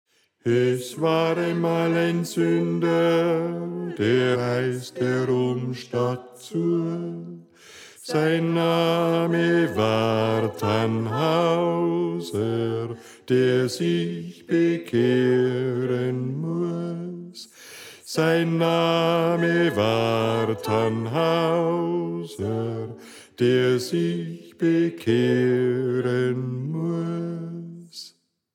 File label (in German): Bass